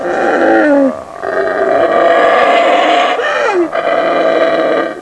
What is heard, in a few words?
howler